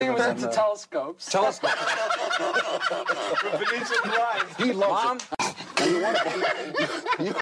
laugh ringtone

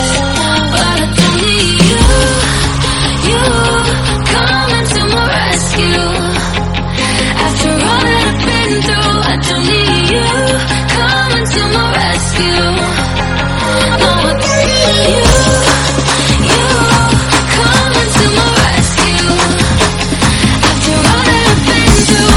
lovely song tone ringtone

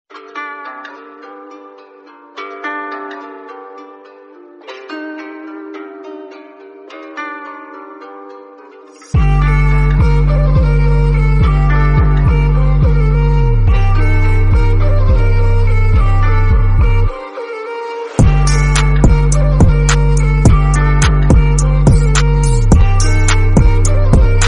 instrumental background music tone ringtone; nokia ringtones

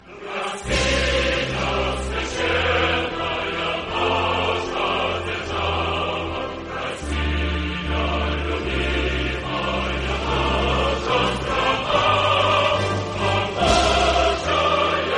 russian anthem ringtone

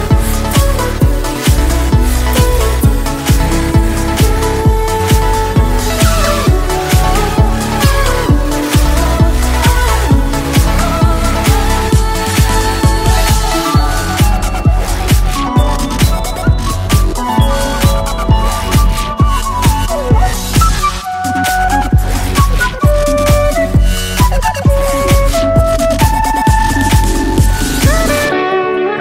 nokia tune mashup ringtone

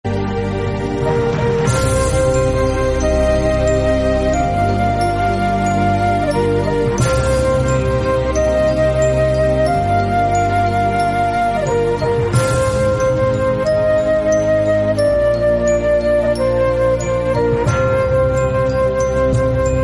nice theme background music tone ringtone; samsung ringtones

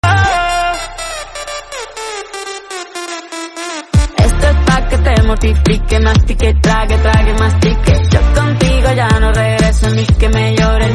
song musical tone ringtone, English

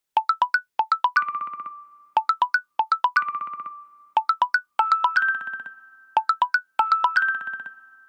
ringtone, nokia ringtones

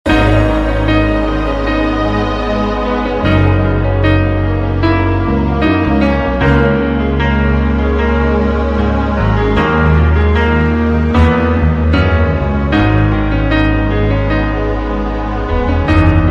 piano ringtone

